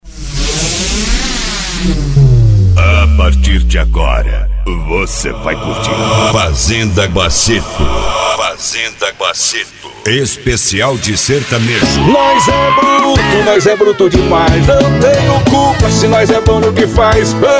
Sertanejo; Fazenda Basseto

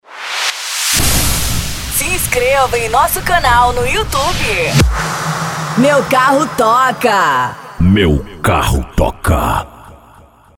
Reggaeton

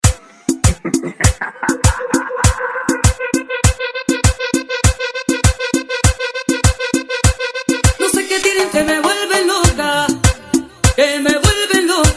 Reggaeton

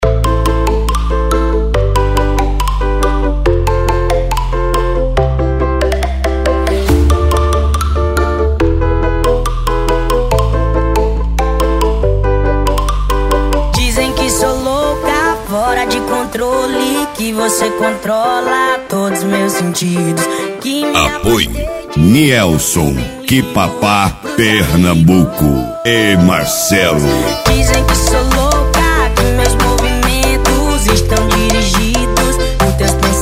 Sertanejo Remix

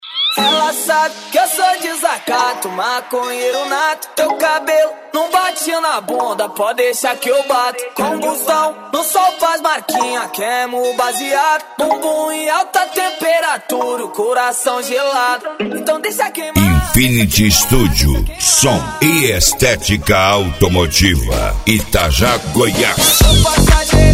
EletroFunk Funk; Infinity Studio 2024

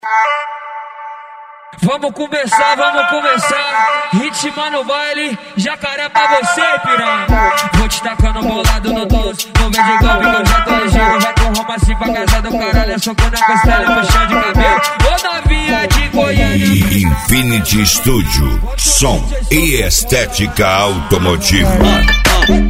EletroFunk Funk; Infinity Studio 2024

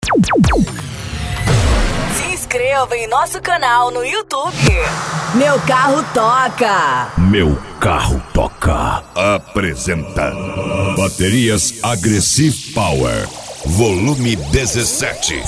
Pancadao; Baterias Agressive Power Vol 17

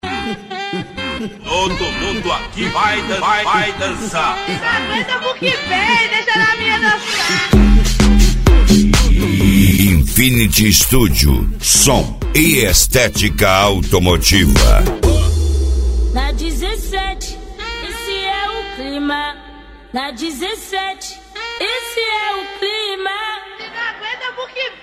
EletroFunk Funk; Infinity Studio; Itaja GO

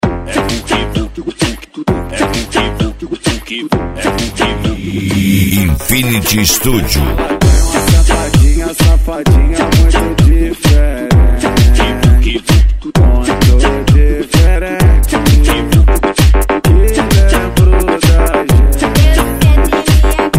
EletroFunk Funk; Infinity Studio; Itaja GO

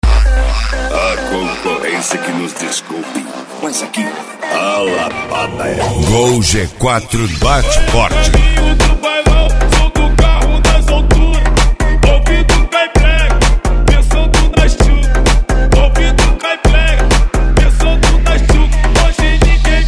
MegaFunk

